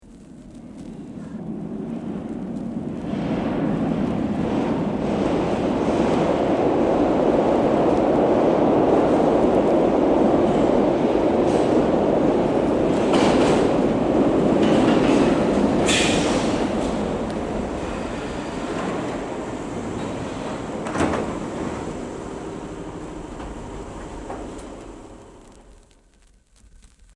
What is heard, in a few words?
Stock Exterior - Enters station; stops, Tube